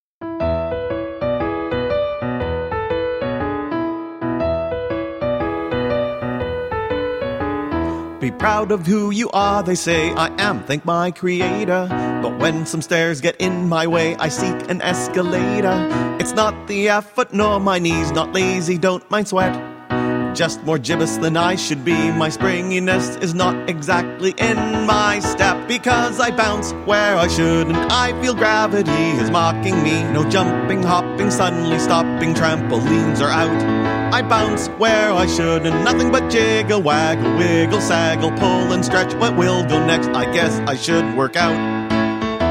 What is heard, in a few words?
Bounce; FAWM2015